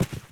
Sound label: Koopaling Shell Land